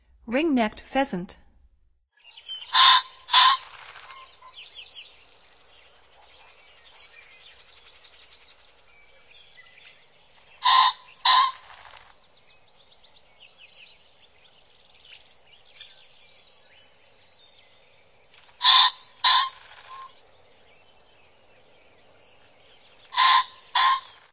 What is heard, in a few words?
Ring necked Pheasant; North America; Stokes